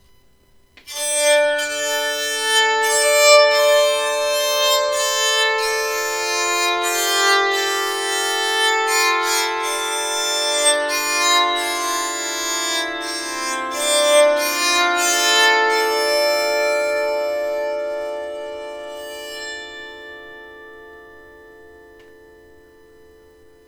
song, bowed psaltery

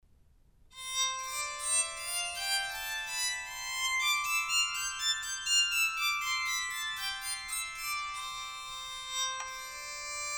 aspen sound, Soprano bowed psaltery

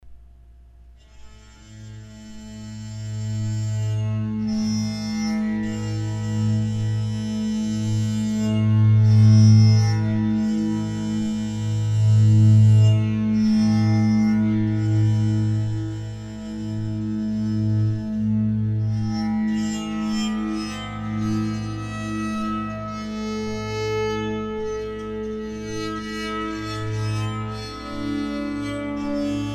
Redwood Bass Baritone, bowed psaltery

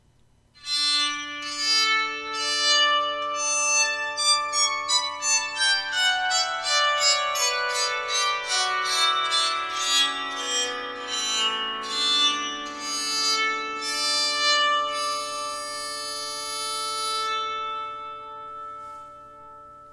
scale, bowed psaltery

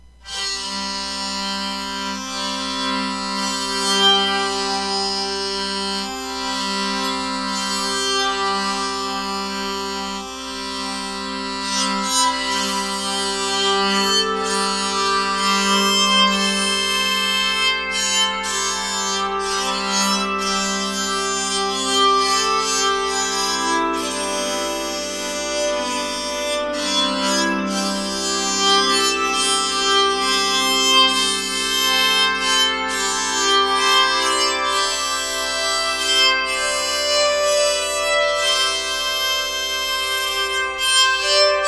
amplified

